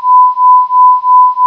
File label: beep